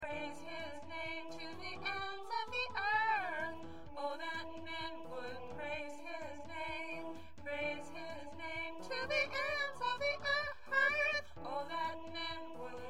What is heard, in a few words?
High Soprano; High Soprano part